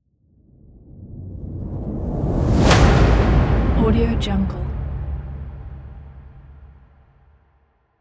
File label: title whoosh; mountainaudio